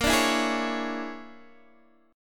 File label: A sharp 7th Sharp 9th Flat 5th A sharp down Guitar Open D